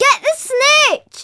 Crowd

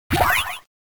VS Push HIT; WarioWare Inc

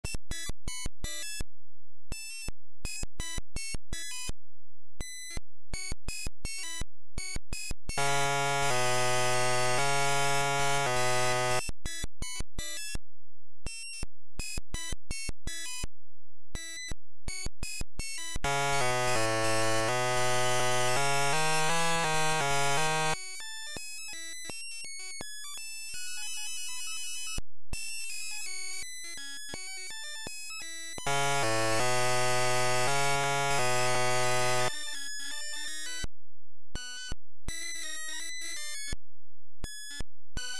Unused Song Damaged; Pokemon Red Blue Yellow

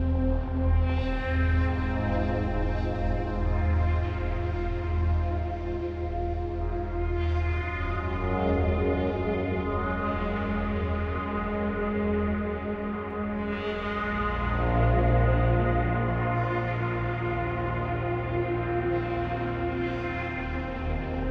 Outside; Mirrors Edge

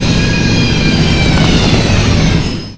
bike trn

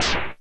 Hit Sample

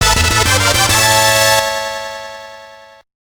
Unused Jingle

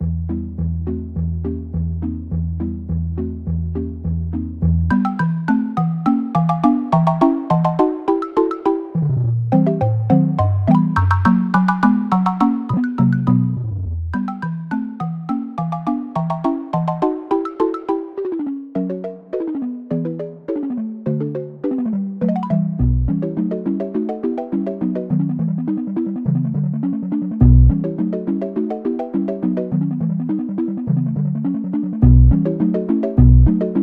test room track; Crash 1 Proto

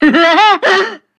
plane bomb laugh; Cuphead

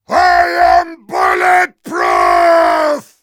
heavy yell

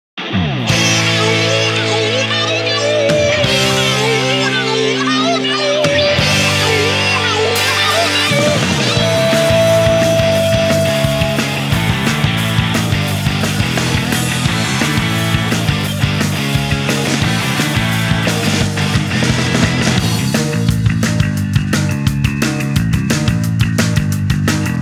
Titlescreen Song; Splatoon; Testfire Demo

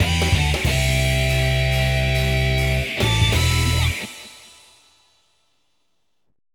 Stage Clear

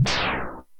Explosion

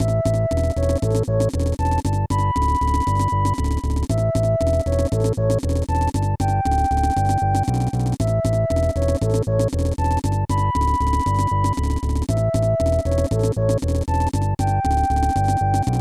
Unused Song; Small Soldiers; SGB

